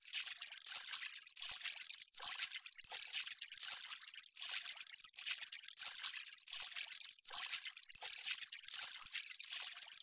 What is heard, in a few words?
Swim